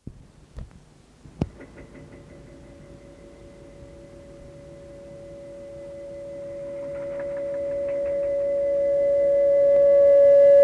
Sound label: Balalaika Noise; R.A.Pavlov "Yablochko"